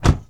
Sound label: Mustang Door Close; Automobiles